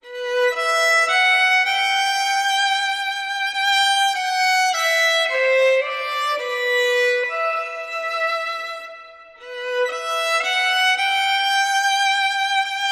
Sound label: Violin